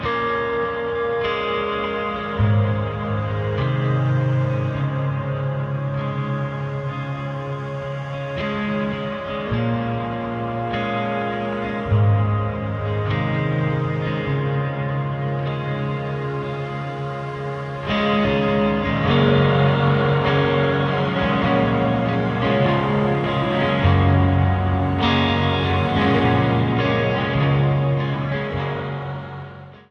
ringtone; FarCry5

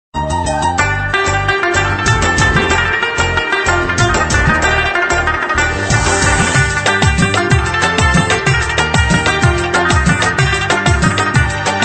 Pashto

